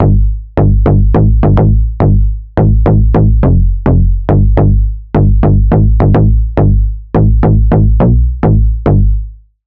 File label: Bass; Execution; Routed Sound; Vital Expansion - Noble Gangster Vol.1